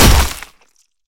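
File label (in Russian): furious hit on ice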